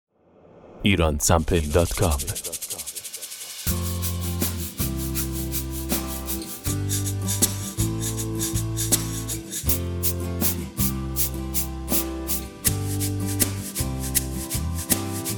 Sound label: shaker